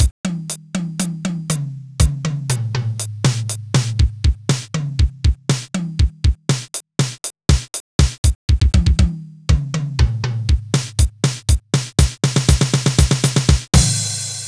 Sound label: Drum Set